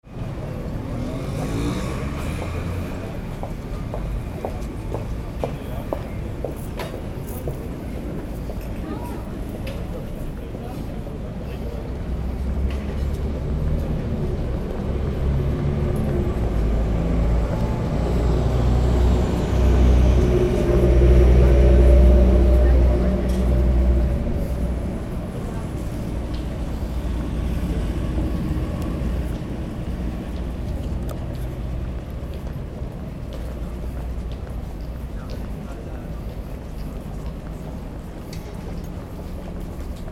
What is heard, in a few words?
street with pedestrian